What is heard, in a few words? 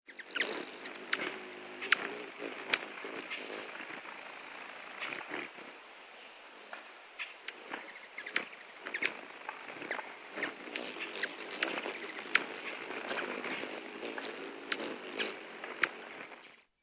Rivoli Hummingbird